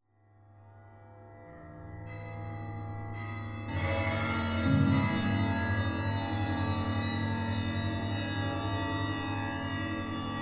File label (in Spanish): campanas Resonancias